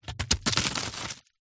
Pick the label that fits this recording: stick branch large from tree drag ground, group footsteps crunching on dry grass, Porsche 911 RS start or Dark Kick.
stick branch large from tree drag ground